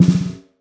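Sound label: Black Brass Mallet Drag, Snares; Drums; Ghosthack - Free Snares 2023; Blk Brass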